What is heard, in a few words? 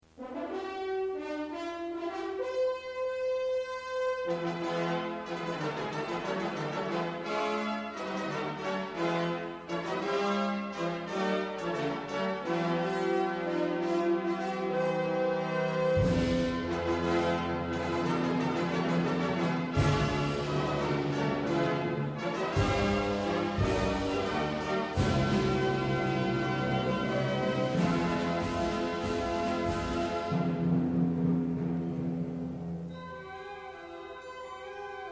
fanfare